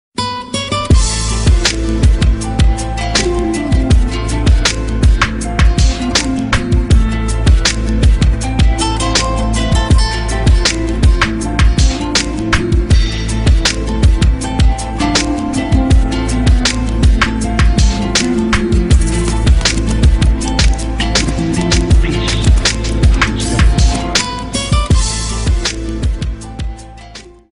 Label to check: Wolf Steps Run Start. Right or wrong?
Wrong